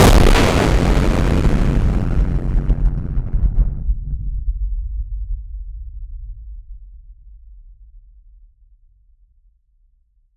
thermonuclear explosion; catastrophe

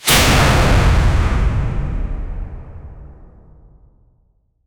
magic appears and strikes; accumulation

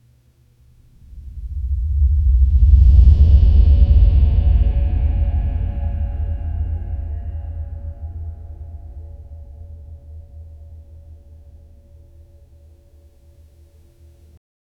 hands slam down as a; darkness

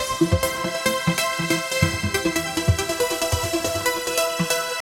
short outrun synth hit wi, synthwave

